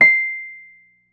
PM-200 Wurlitzer C7; Keyboards; Ableton Foundation FreePacks

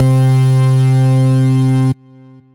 Lead, Synth Lead

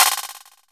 LoFi bounce; Loafa; Ableton Foundation FreePacks; Wicked Kits

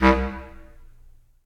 Bass Clarinet Solo Staccato; Ableton; Orchestral Woodwinds

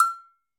Agogo E High, Latin Percussion; Drum Hits; Bell; Ableton; Factory Packs

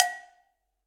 Cowbell Mid Ambient Loud Punch; Drums; Drum Hits; Standard Hits; Ableton; Factory Packs; Session Drums Studio

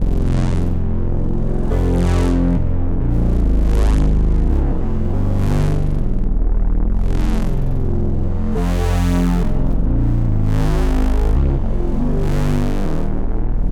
Hazy Bass Pulse, Fmin 140 bpm; MIDI Clips; Tonal; Motifs; Ableton; Factory Packs; Mood Reel

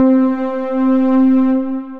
Padz Tapz, Synth Pad

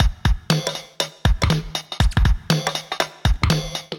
Toy LoFi Kit, Drums; Ableton; Factory Packs; Vibrations; ModeAudio

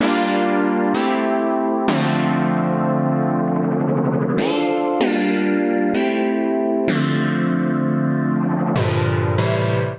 Synth Disco Stab D Minor 96 bpm; Melodic; Tonal; MIDI Clips; Drive and Glow; Ableton; Factory Packs

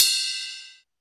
ride bell, Foundation Drums; Ableton Foundation FreePacks

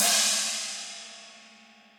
China Bright Room, Cymbal; Drums; Drum Hits; Standard Hits; Session Drums Studio

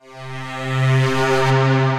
Moon Pad, Synth Pad; The Forge by Hecq; Ableton; Factory Packs

